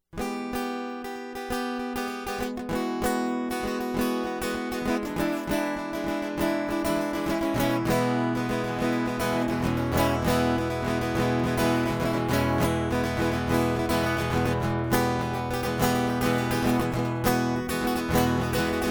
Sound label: Guitar Ditty